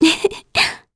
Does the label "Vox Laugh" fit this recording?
Yes